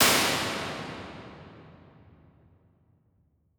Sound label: Hybrid Plates Classic Plate, Impulse Responses; Ableton Live 12 Lite